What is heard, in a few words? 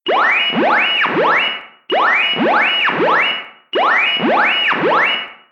out of control; マシン暴走